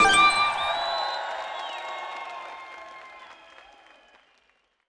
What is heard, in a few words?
ANSWER RIGHT SINGLE